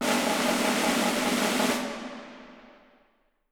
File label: Percussion; Miroslav Vitous; Harp